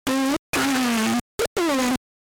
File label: Pikachu SFX; RBY